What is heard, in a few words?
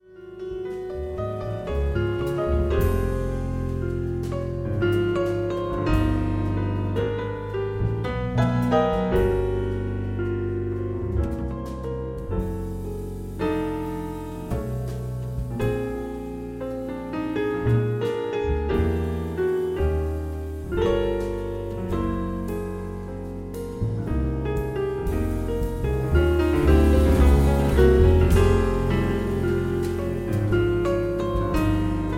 Mazurka In F Minor